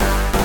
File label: synth